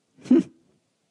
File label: idle hm; goldentavern